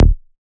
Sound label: Bass E; Ashes Bass